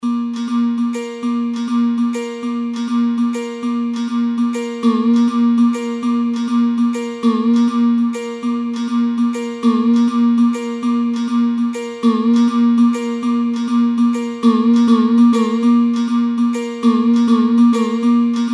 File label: ektara; gopiyantra